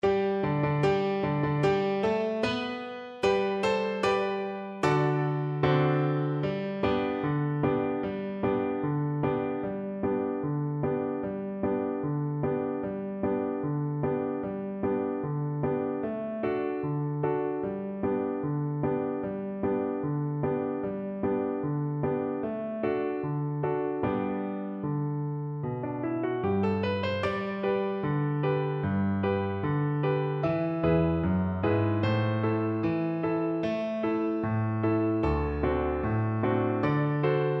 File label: Recorder